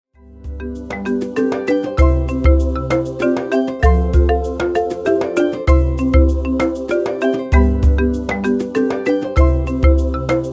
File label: african streams, background music